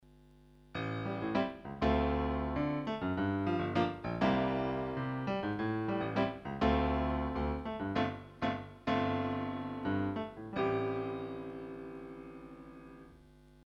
Pa3X LE Piano

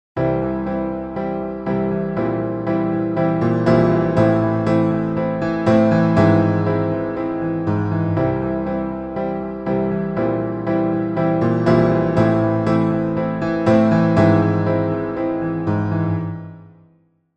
RCF M 20X Chorus

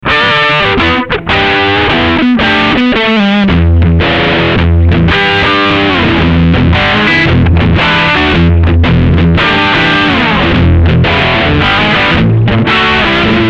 Blues Drive

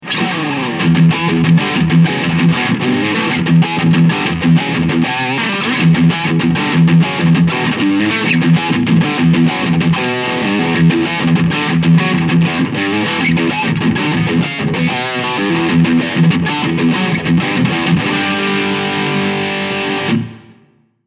Lead

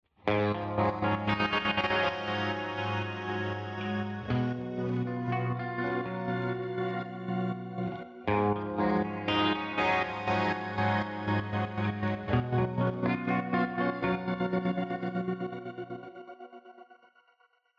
Tremolator

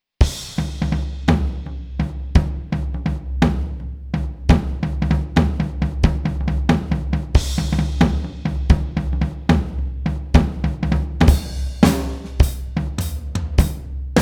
Blues div. Grooves Speed Tone; Harley Benton Dual Effect Double Jammer

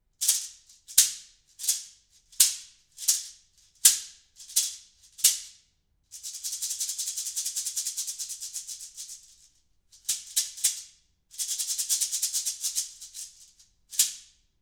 Shekere

